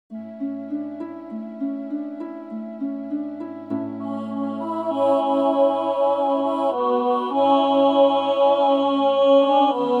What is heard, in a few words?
My Homeland Tenor